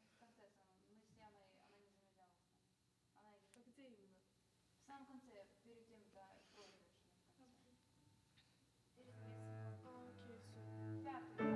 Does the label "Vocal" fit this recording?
Yes